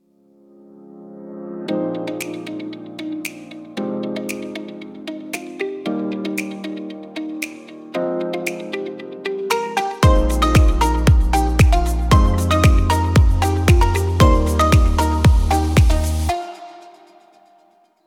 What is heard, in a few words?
music